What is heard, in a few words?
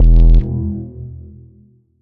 rad pulse